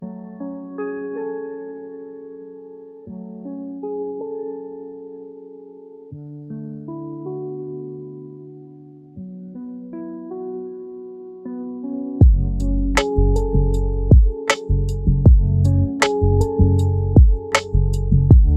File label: focus lofi